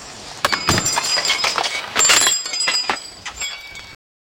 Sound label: sleigh bells jingling in